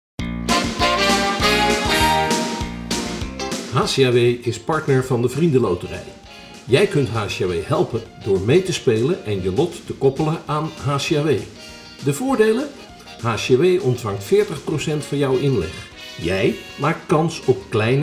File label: teaser jingle; Game Songs